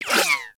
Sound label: Laser Whizzby; Warframe; Bullet Impacts; Whizzbys